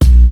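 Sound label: bass drum sus; 300 Drum Machines; Yamaha Motif ES-Series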